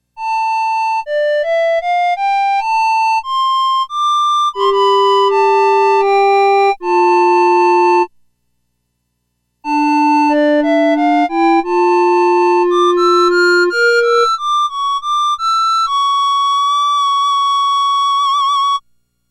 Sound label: Flutes